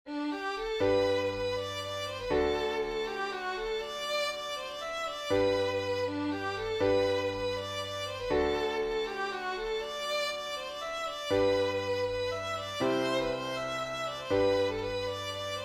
menuetti

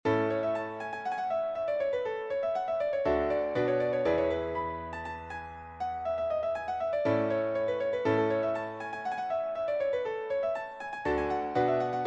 polkka

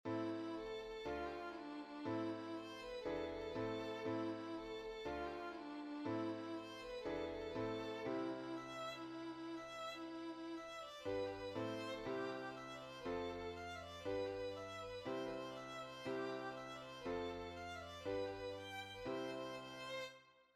jenkka

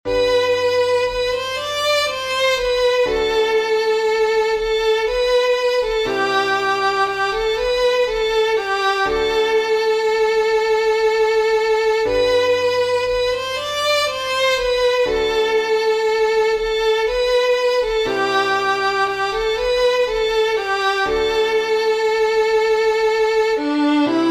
valssi

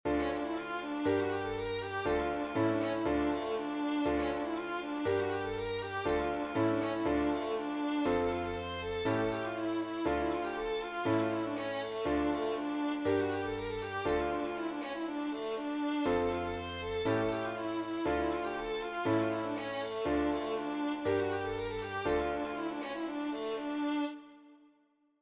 sottiisi

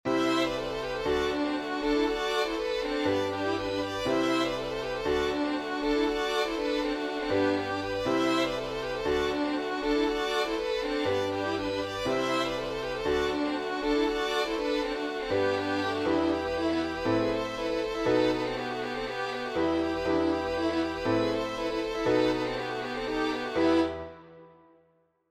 polkka

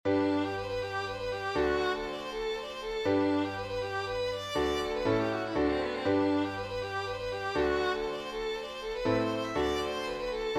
menuetti ja polska

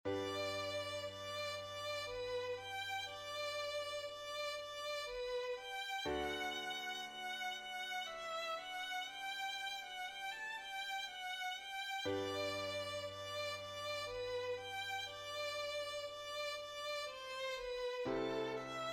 valssi

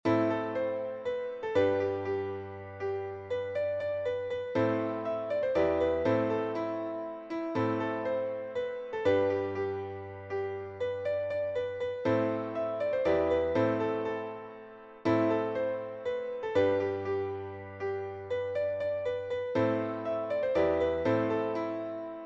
bisonpolska

